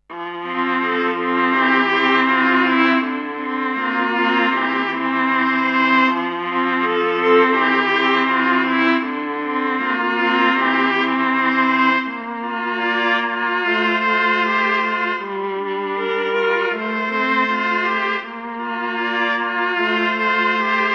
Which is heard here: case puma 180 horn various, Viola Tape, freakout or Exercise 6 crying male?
Viola Tape